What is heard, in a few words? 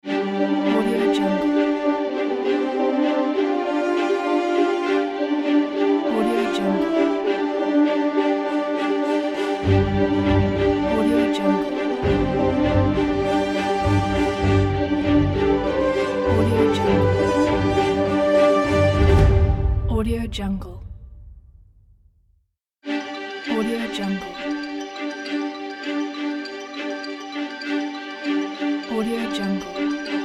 breaking news